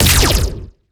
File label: red lightning strong